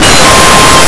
key; Blue Heat

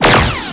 blaster

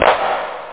clap centre; OctaMED SoundStudio V1; drumkit; claps

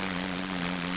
buzz

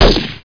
M16 crack; SPECOPS

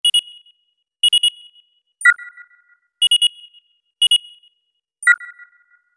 satellite ambi; Earth and Beyond; EA Games

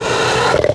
attack; PC Gamer IT CD 45; SMAN DEMO; baddys; hookman

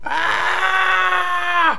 FALL; player

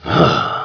Aah; Army Men TiS

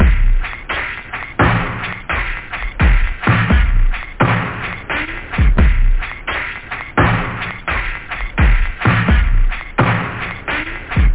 Groove; OctaMED SoundStudio V1

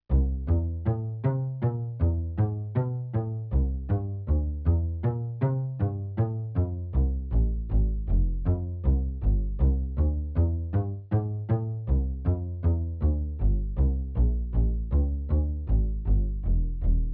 bass

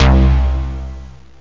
sq-sound, keyboards; Zoom - Release 2; Active Software

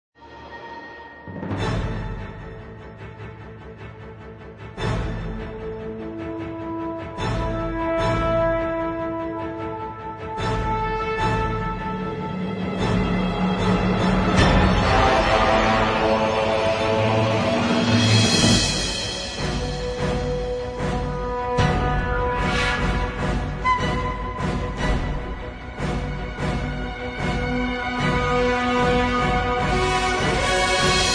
game music; plane arcade

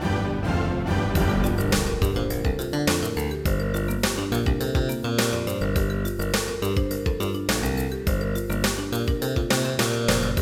Funk

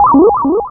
BONUS COL; parasite

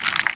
rock deb, impact debris rock

